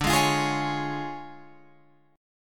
D Minor Major 7th Flat 5th, D, down, Guitar Open F; strummed